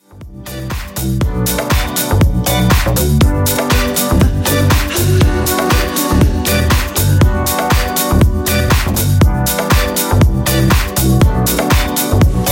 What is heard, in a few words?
Club House 120Bpm